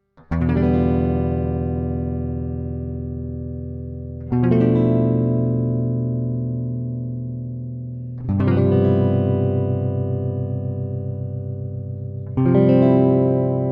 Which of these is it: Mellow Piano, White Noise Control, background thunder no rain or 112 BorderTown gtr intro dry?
112 BorderTown gtr intro dry